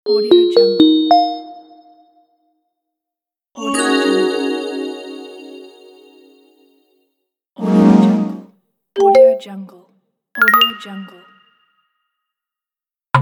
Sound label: message notification